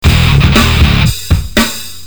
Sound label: depressing rock music